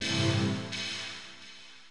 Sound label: SYNTH PAD HOUSE 125 A; eJay; Sphere